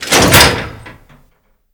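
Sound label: target pop up metal; Call of Duty Modern Warfare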